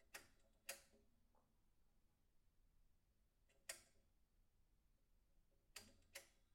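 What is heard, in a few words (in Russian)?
переключатель лампы далеко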